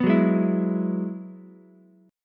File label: Ab Minor 7th Sharp 5th Ab, Ukulele; strummed